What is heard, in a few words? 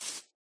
grass, step; Minecraft